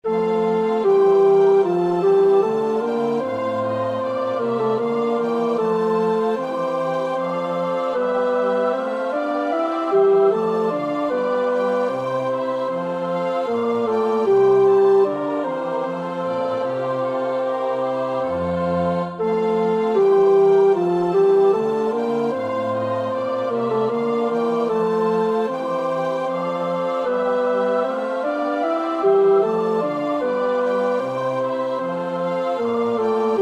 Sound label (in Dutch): tenor, koorpartij oefening; Bach (Johann Sebastiaan); BWV 172; Von Gott kömmet mir ein Freudenschein